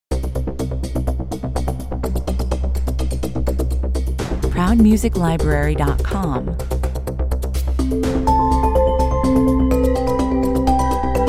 commercial

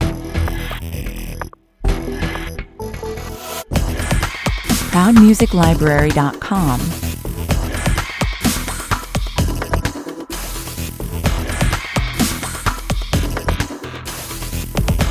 energetic dubstep opener 128bpm

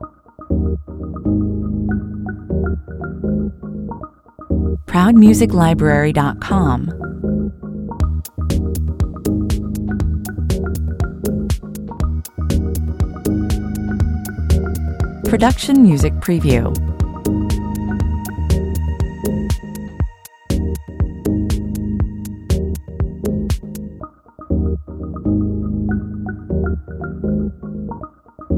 house game (on hold) 120bpm

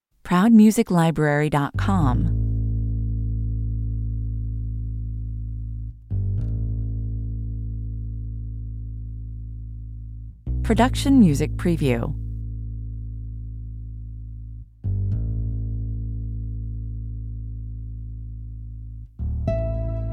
suspicion (bass harp and strings)

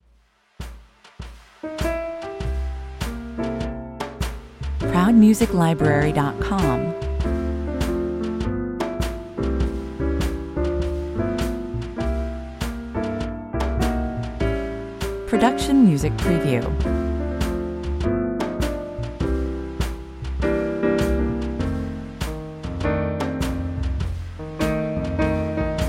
jazzpiano 94 bpm

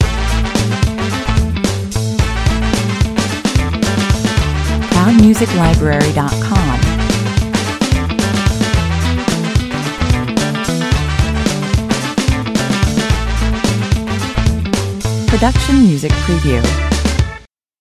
loop

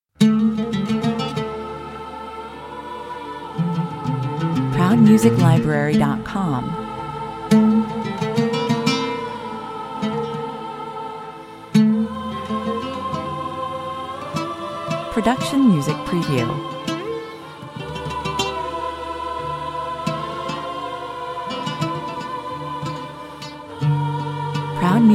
solo oud

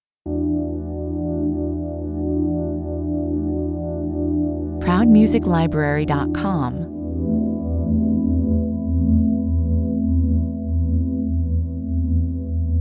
deep ambience 66 bpm

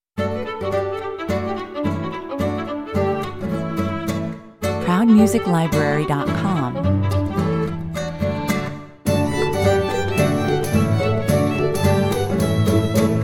drinking at the tavern 216 bpm

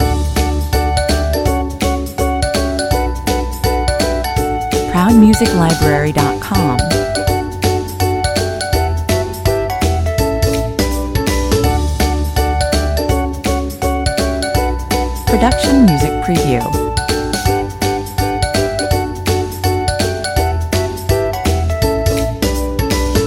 happy explainer loop

